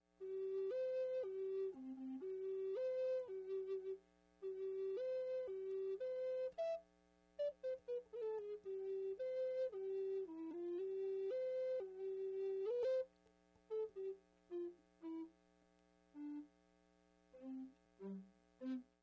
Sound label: tenor recorder